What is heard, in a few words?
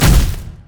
basicattack; maokai